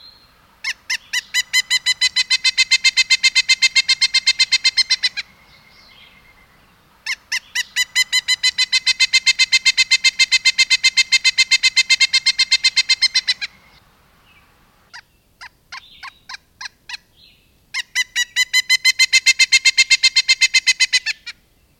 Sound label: pileated wodpecker